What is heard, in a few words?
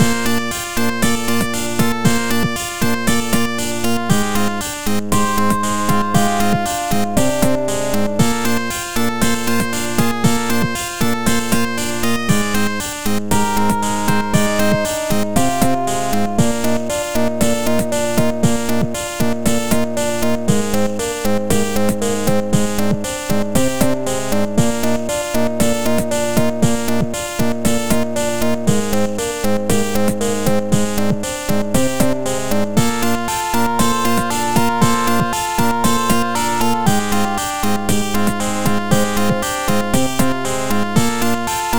Living Waterway (Internal Percussion)